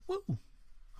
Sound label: wahu small